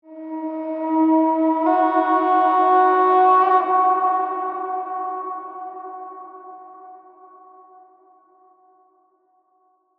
Musical Horn Call, Eerie; Musical Sound Design; BlastWave

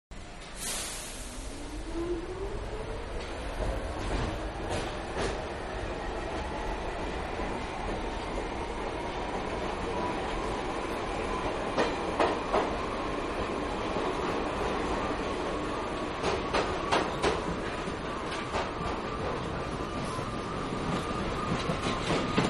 Subway Riding Between Cars; Vehicles; Subways; Manhattan Production Music

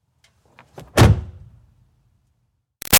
Car Highlander Door Close Slam; 2005 Toyota Highlander V6; Vehicles; Cars

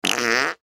Human Mouth Fart; Mouth Fart Low Fast Ascend Male Ten Yrs Boy; Human Mouth Fart Low Fast Ascend Male Ten Year Old Boy

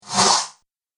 Whoosh; Film Fx

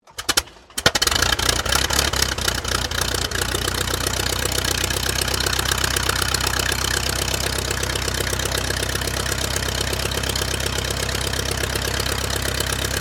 AIRCRAFT DH82A DEHAVILAND TIGERMOTH MISS-START; 1936; Airplanes; Vehicles

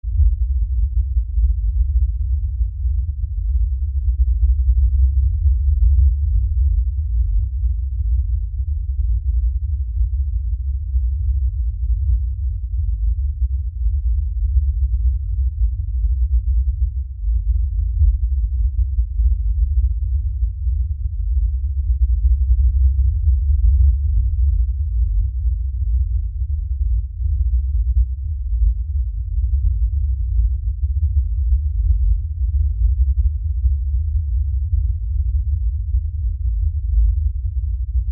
LFE Rumble, Lfe Rumble Low Bass; Blastwave; Sound Design

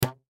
Rubber Band Snap; Tight; foley; Miscellaneous Office Sound Effects; Blastwave

